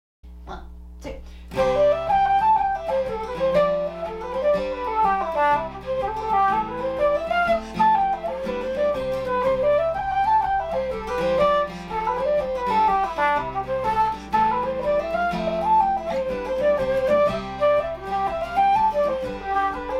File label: Flute and guitar